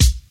good kickdrum c key

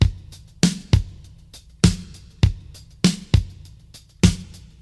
100 bpm 00s drum beat b key; drum loops

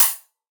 open high hat c sharp key

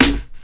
shiny snare one shot f key; snare drum samples

